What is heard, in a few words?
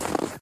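Run Right Gravel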